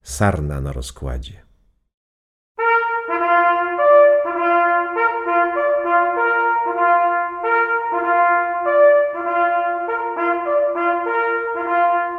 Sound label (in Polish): sarna na rozkladzie solo